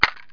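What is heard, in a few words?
mousetrap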